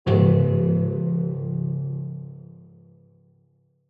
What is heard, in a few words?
Negative Dry; Onoma